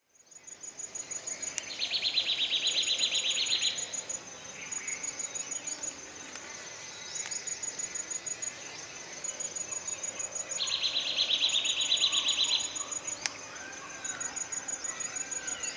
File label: Indian Nuthatch Sitta castanea, indian nuthatch call